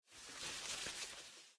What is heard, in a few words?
cloth long; smite; scylla